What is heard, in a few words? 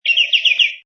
Trill, Short, trilling bird chirp from a small garden bird.; Birds; Animals